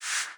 足音; LcdDem SFX

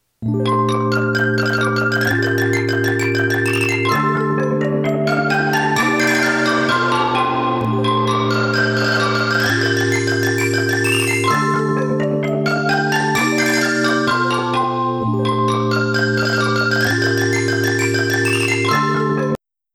太いサウンドと効果的なリバーブ; ニコニコ動画

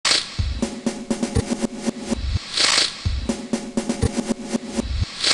シャッター; ニコニコ動画

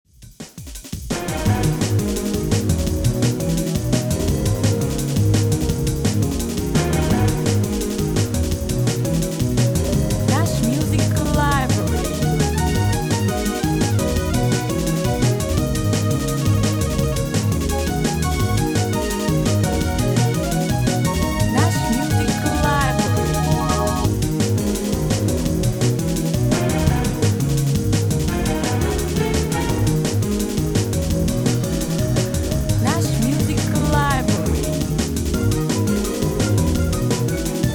デデドン！; サンプリング; ニコニコ動画

